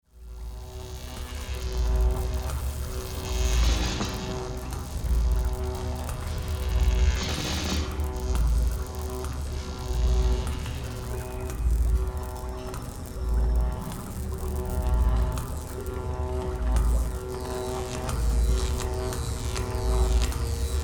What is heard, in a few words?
ambient